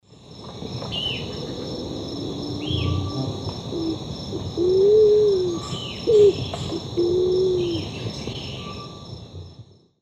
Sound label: Patagioenas speciosa, song; S. America; Eastern Lowlands